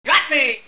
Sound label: bandit flinch; gearbox